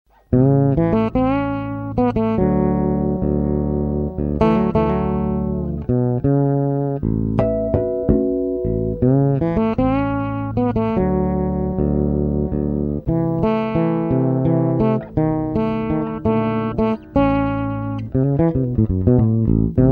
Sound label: Fretless 6 strings